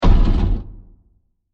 Deep Wood Impact; Burst Sound Effects; Bursts (Sound Effects:Impacts)

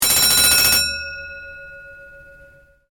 ALARM BELL RING, Sounds onboard HMAS Castlemaine; Boats (Sound Effects:Vehicles)

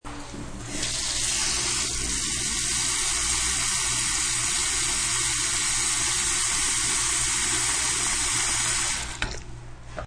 Bathroom faucet running.; Household

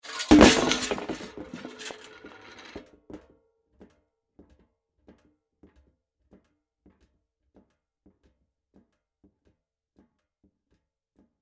Impact Metal Crash Objects Fall; Sound Effects:Materials; Blastwave

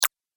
Robot Eye Clicks Open; Sci-Fi Robot; Robot Sound Effects

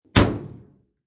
Clothes washer is shut; Laundry; Sound Effects:Household

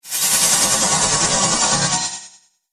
Slow Electro Whoosh; Andrew Fleming

